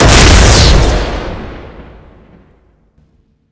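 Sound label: divine spear explosion, sound/weapons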